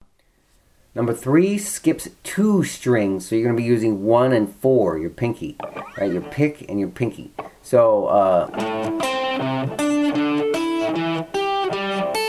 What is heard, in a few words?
hybrid picking exercise, guitar